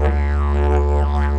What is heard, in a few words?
Digerido; Best Service; Ethnic Journey Sound Cube